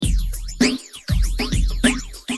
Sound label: drums biphase; mutron